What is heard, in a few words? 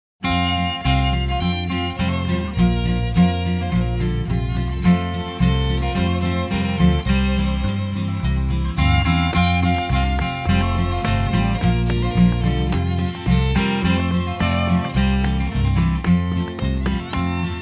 Good Ole Country Music